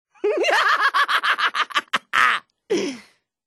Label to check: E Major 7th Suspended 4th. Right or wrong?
Wrong